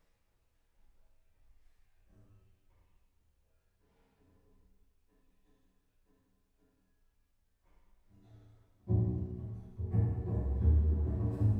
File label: Bass